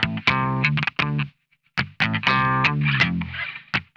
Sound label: RICKETY OCT, Funk Guitar; Zero G - Funk Guitar